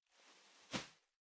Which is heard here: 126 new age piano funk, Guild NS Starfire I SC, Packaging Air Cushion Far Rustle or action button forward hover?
Packaging Air Cushion Far Rustle